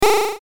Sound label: powerdown